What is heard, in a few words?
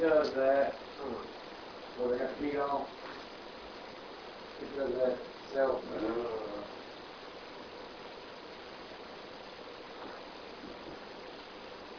Unexplained moan Master Bedroom